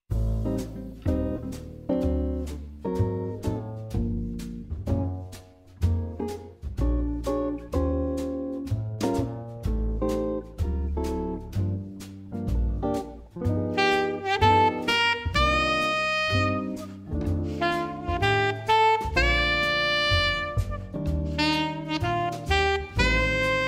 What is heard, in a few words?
Alto Sax